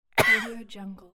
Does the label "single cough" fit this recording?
Yes